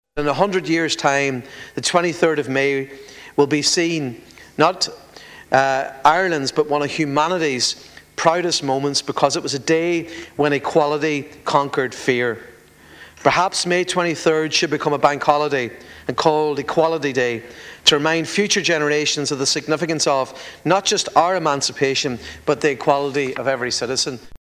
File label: pad